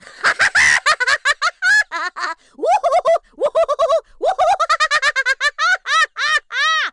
LAUGH, LAUGHTER; 1000fx

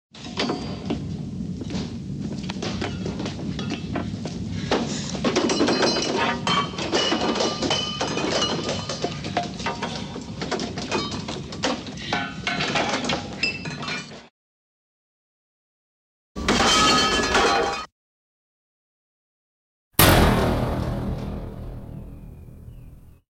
Metal Clank - Metal Buzz; 100 sound effects

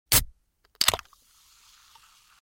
Soda Can Open w Fizz, Household

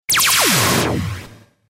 laser

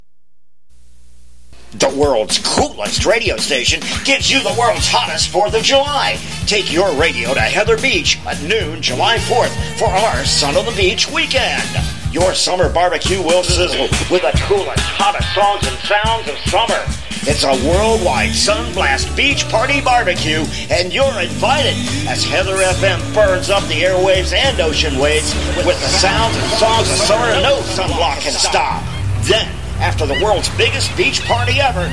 promo mix; Heather FM; 4th july

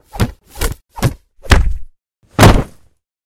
Block-Kick-Body Fall Combo; Hollywood Edge Martial Arts and Human Impacts

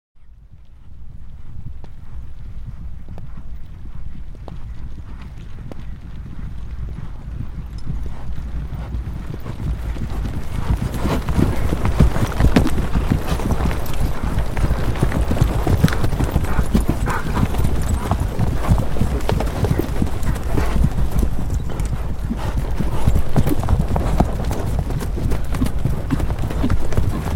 Horses Work Trot; Historical; Hollywood Edge Historical Series; War Horses Armies & Weapons

